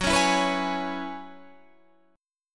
Gb 7th Suspended 2nd Suspended 4th, Guitar, Half Step Down; Gb7sus2sus4 strummed